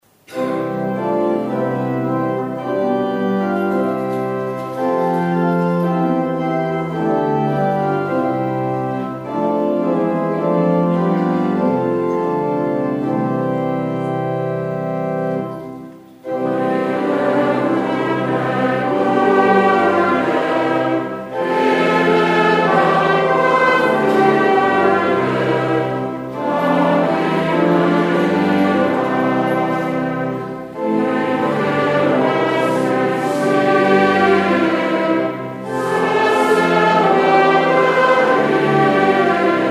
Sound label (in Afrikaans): Gemeentesang; Ld 525 verse 1